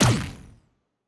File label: Weapon Shoot; Sci-Fi Sounds